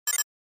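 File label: Flash Beep Electronic Triple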